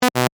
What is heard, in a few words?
Multimedia Negative, Technology, Multimedia, Negative, Element; Alerts